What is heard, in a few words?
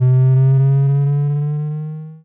Science Fiction Sci-Fi Electronic Power Up